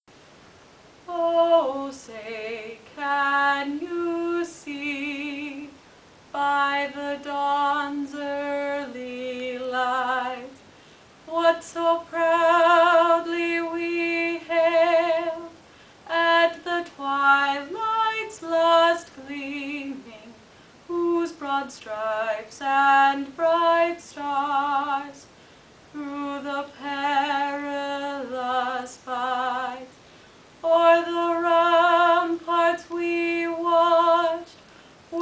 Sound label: alto first half